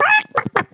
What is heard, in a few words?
Chicke; Breakout Demo; farm